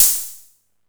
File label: hat, Techno 138 BPM